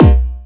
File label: Drum Fx